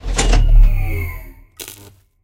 Removable Power Core Off

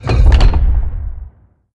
Gears Stop

